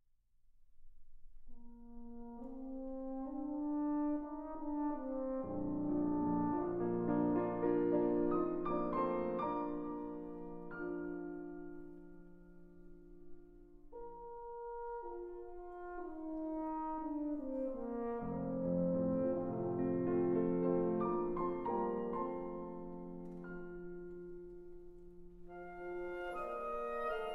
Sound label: Piano Concerto No. 2 in B-Flat Major, Op. 83 I. Allegro Non Troppo